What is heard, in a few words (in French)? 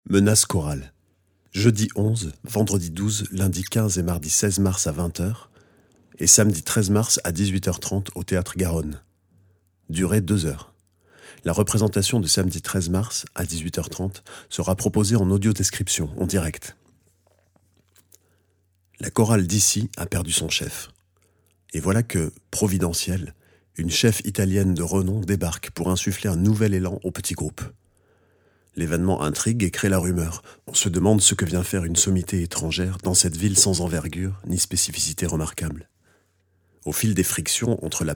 menace chorale